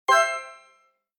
card bonus; tyrants edge

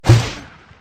rocket; fertig classic games

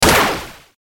shell; kart

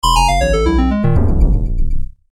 pay; monopoly; fertig classic games

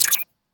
ui hover; orbit

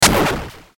player shoot; Asteroids-2026

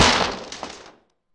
smash trashcan; monsterplex

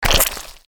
kart flatten

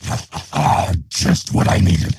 goblin; the jewel weaver

